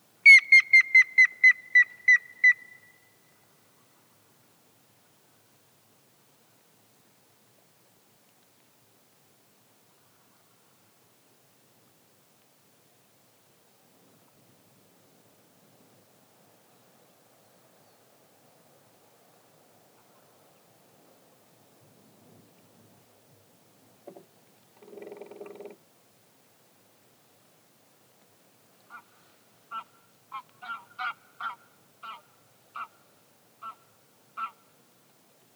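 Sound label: grey-headed woodpecker voice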